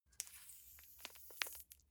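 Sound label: gel dispenser squish air, bathroom; haus garten; badezimmer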